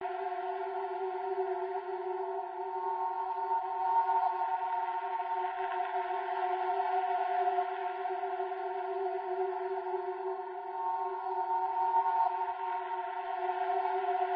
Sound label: DRONES LOOP